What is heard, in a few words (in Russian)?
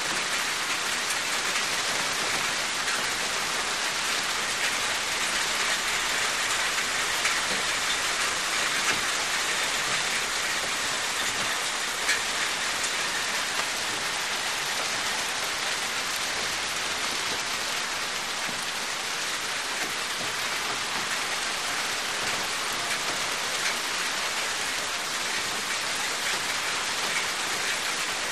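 дождь капает по металлической крыше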